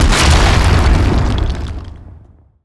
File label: explosion strong